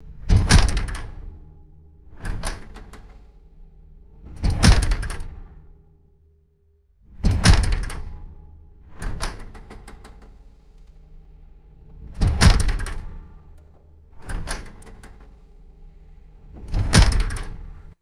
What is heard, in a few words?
metal screen door bumping shut and rattling; doors